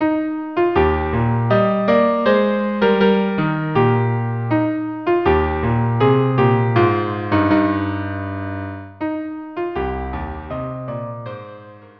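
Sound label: Viola Cello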